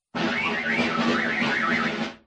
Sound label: long spin